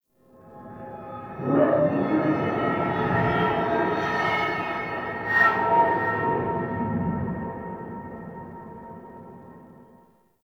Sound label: Metallic Drone; Ambience; Warframe; Nineteen Ninety Nine